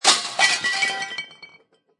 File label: Cartoon Crash Pans Glass Bottles, Junk impact, glass; Comedy/Cartoon; glass and pots fall and rattle; Funny Sounds; Lynne Music